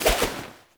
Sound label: Fishing Spear Charge; Warframe; Weapons; Eidolon Fishing Spears